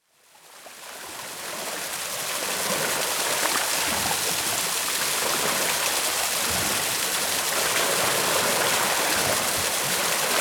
Litoral, Font; Font des Verger; Sa Costera

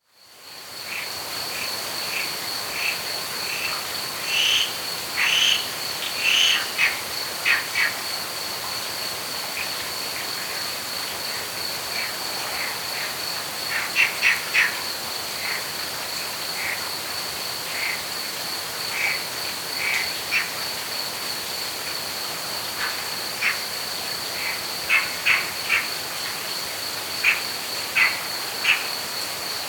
Amfíbis, Granota verda

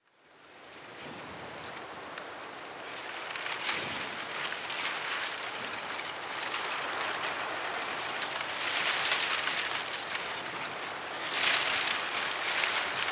Litoral, Penya segat, Embat mirador; Garballons; la Trapa

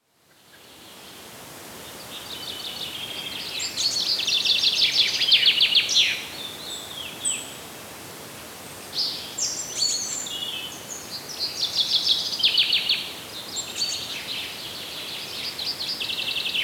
Ocells, Diàleg aus

